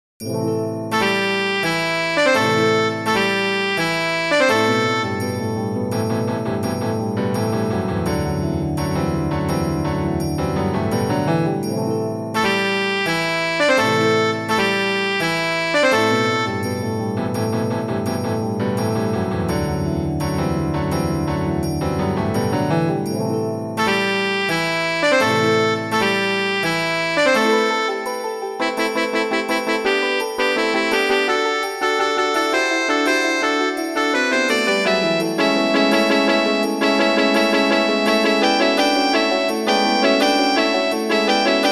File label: sop1, midi; britten ceremony